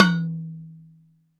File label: DM5 Perc, Alesis DM5 drum machine